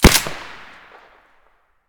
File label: saiga silencer mono, weapons